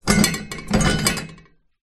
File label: household toilet roll pull on metal holder rattle